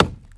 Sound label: Chop; PC Gamer IT CD 46; CT DEMO; Ambient